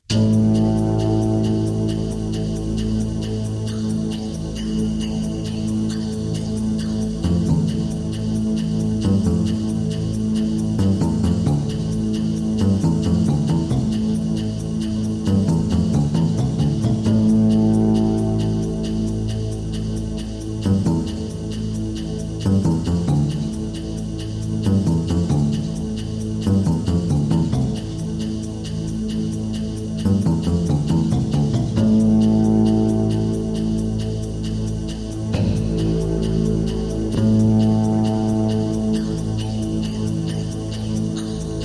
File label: Ambience Tension